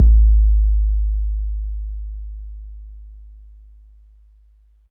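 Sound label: bass drum 808 MPC60 Smooth G#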